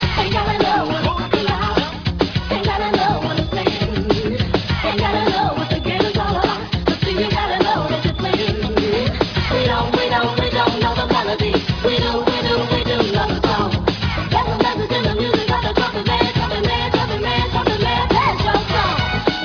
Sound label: trumpet